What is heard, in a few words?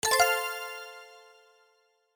success